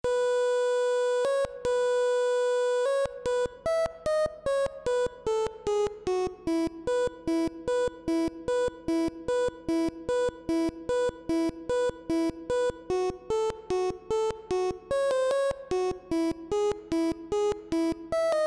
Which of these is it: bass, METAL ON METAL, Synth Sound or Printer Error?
Printer Error